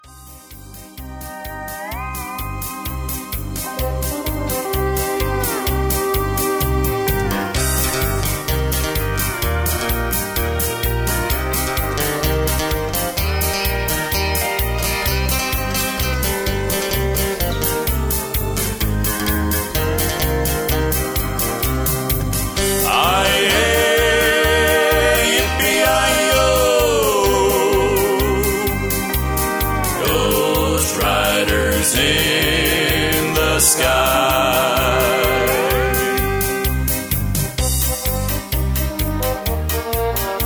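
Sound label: Instrumental with background vocals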